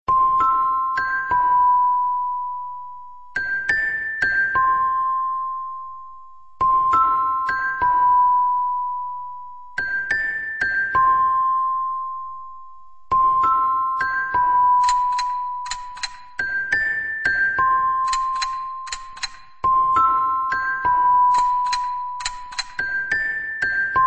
one missed call tone, ringtones electronic

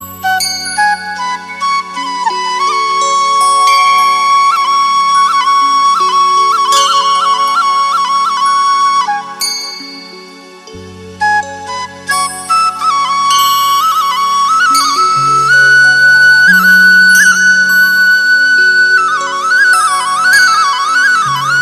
romantic fluit; ringtones

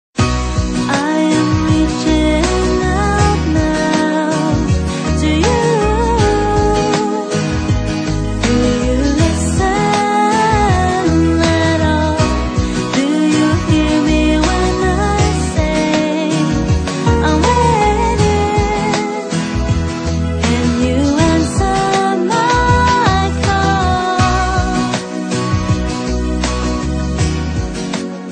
nokia 1600; ringtones

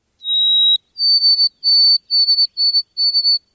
White Throat Sparrow

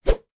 Woosh Sound Effect

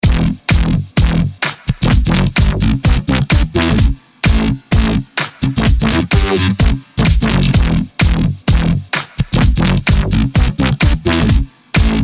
nice beat; hip hop

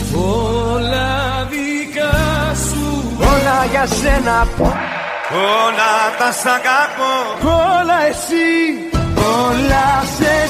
Greek mix

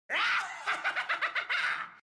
Laugh

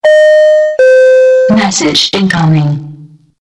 incoming msg

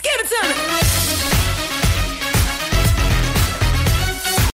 Sax

